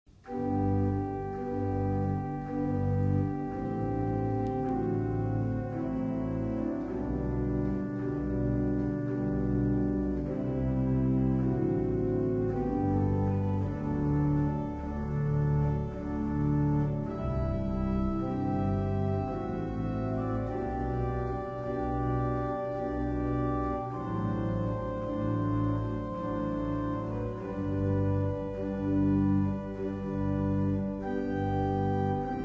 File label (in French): Trompette